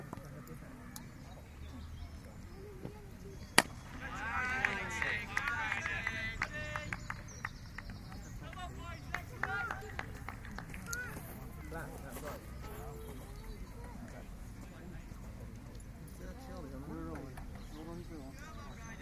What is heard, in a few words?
cricket match low bradfield